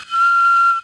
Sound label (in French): tube